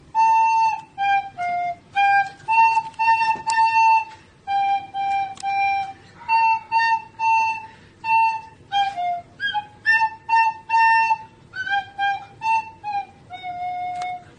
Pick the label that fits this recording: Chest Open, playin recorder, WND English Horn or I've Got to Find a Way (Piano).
playin recorder